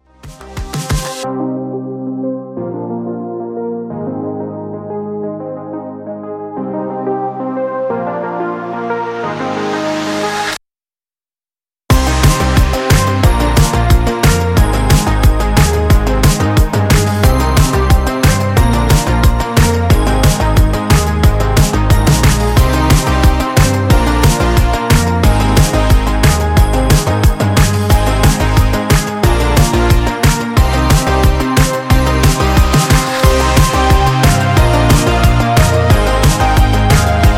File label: Serum Presets